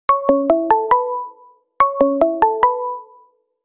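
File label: hangouts call zil sesi, hangouts call ringtone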